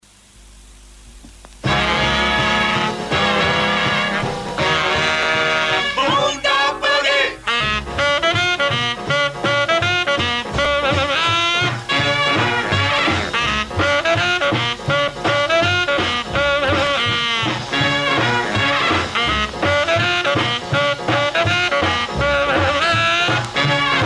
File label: Boogie Orchestra